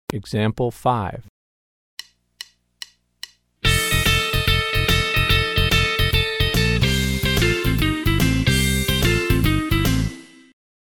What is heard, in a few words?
Country Rock